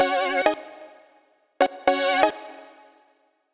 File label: SynBrass (Eb) 128bpm; Music Loops; Kershaw; Ableton; Singularities